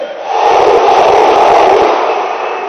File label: Augh Augh